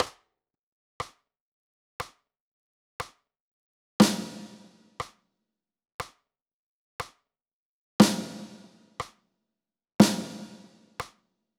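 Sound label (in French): ronde blanche noire croche, batterie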